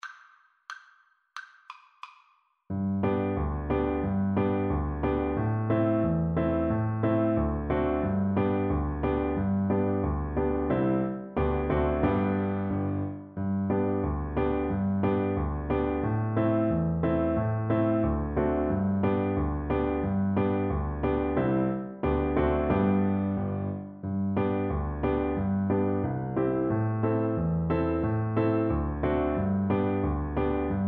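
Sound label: recorder karaoke; devils dream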